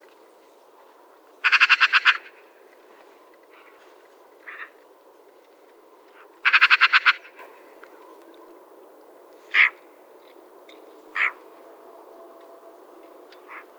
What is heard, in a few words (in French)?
pie appel